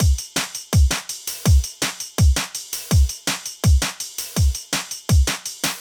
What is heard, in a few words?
DnB Step 165bpm